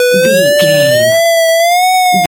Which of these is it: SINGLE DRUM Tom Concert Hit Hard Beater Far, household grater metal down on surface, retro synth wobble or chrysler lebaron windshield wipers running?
retro synth wobble